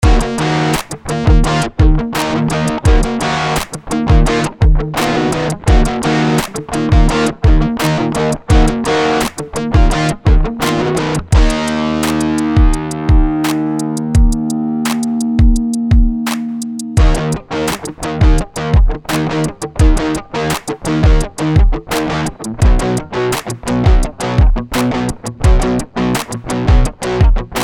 Rock Guitar Trap

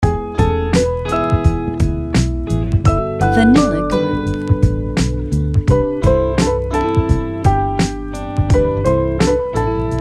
Three Piece Hip Hop

